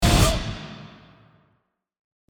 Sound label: Revenge Vocal; Gothic Storm Music